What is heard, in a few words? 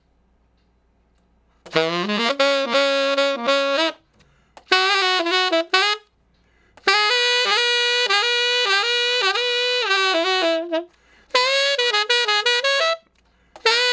Sax Shuffle (Db); Crimson Blue and Fabulous Horncraft 4 RnB; Horncraft for R&B; Solos; Ten Sax